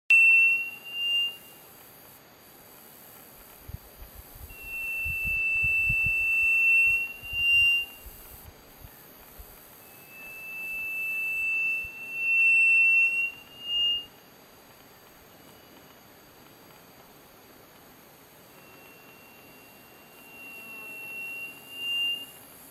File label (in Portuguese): Canto do passaro saudade